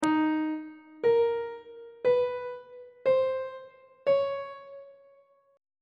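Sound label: d sharp diminished 6 minor 6 major 6 augmented 6 intervals on treble clef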